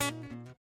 Guitar Lick

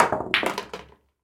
Pool Ball in Pocket

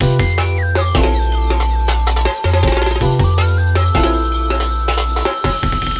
Old Mash Up DnB

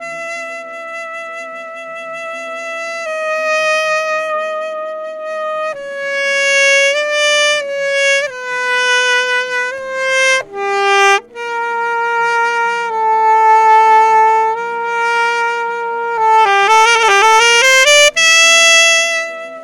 Long Sax Melody

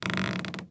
Creaking Wood Floor

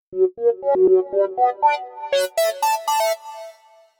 Juno Synth Riff

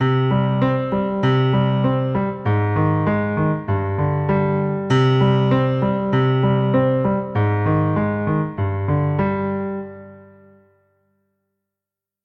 Grand Piano

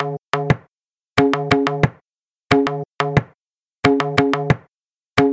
Pakhawaj 90

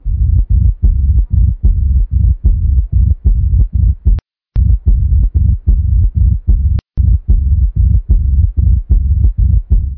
Heart Murmur

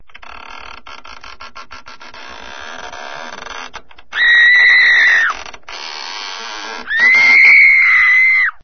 Horror Sound

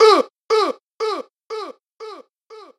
Huu Echo Out Vocal

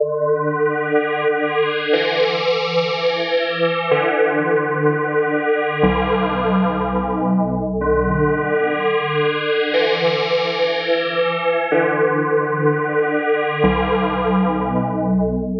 Old Trance Synth

